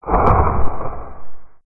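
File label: dragon pain sigh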